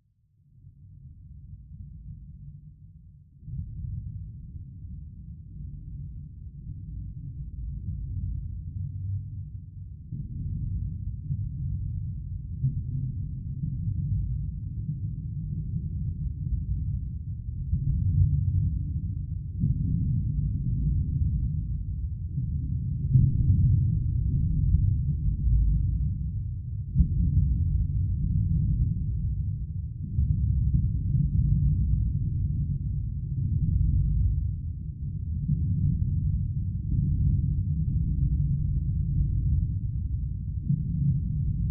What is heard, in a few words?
nature water underwater ambience rumbling